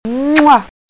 吻; PPT音效

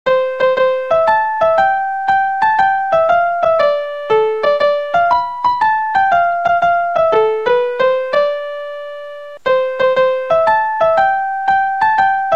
音乐铃声

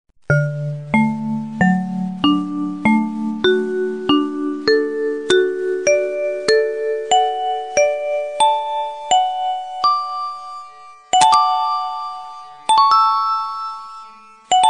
下课铃声; 钟声; 广播室铃声系统

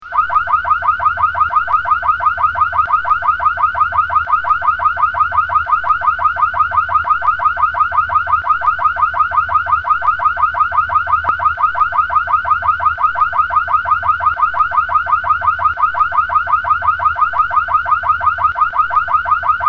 警报声; 告警; 广播室铃声系统

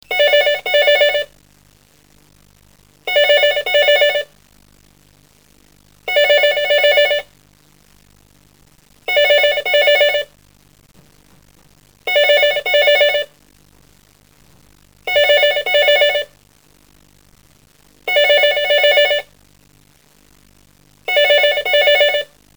电话铃声; 告警; 广播室铃声系统

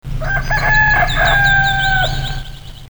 鸡叫

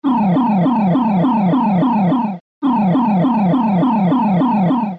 盗警, 告警; 广播室铃声系统

